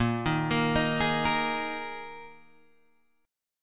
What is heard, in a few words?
A sharp 7th Suspended 4th A sharp, Guitar, Standard; arpeggiated; JGuitar